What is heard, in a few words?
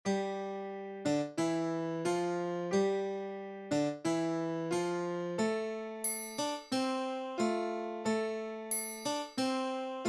safety video music; nuclear